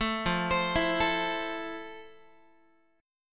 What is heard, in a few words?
F Major 7th F, Guitar; arpeggiated